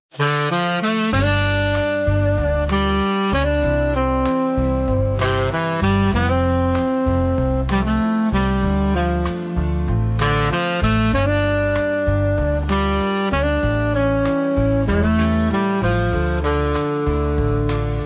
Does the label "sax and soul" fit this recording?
Yes